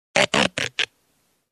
Scratching Creak

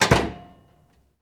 Aluminum Door slam

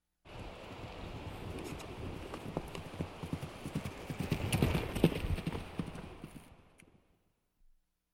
Horse galloping through

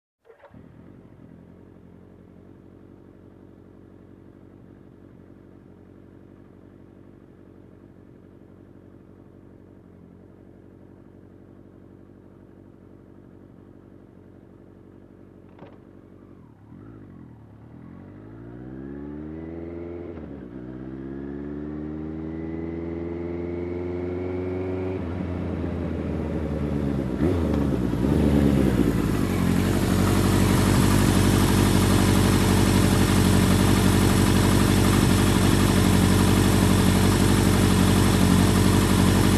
Motorcycle approaching and turning off engine

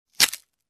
Carving Wooden Stick

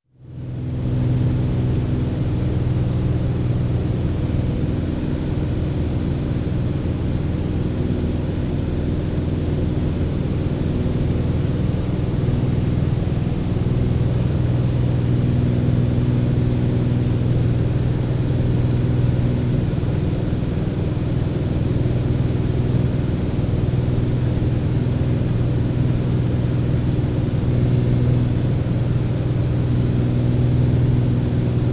Propeller Aircraft in cruise

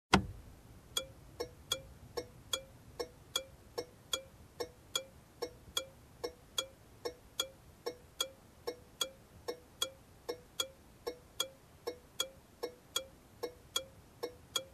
Car hazard lights on

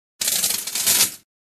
Metal Brush

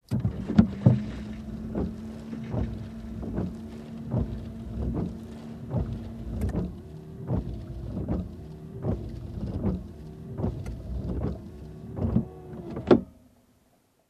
Windshield Wiper

